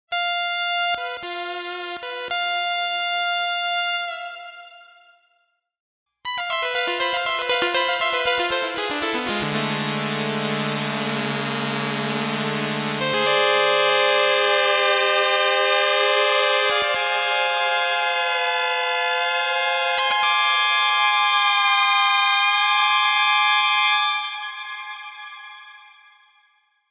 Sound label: MicroTuning; Hedra; The Enzo And Mercury7